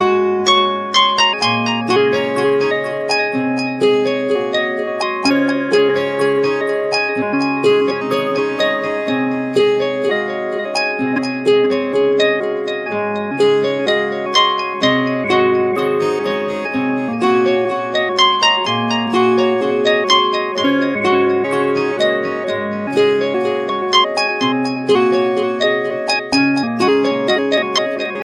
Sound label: lg g7 fit ringtone